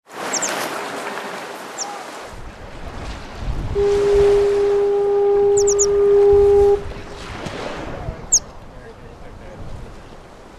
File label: pipit; American Pipit and fog horn, Superior 9/27/2003; American Pipit MP3